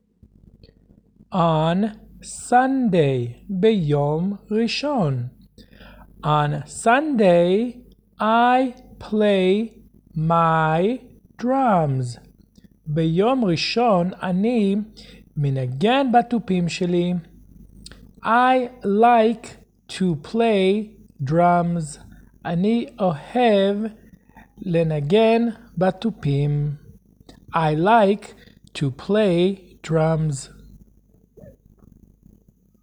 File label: Drums